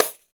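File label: Pandeiro Crash; Roland BK-5; Percussion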